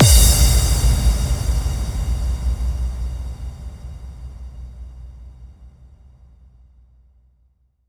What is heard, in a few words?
Bassdrums FX, FX Kicks; Vengeance Samples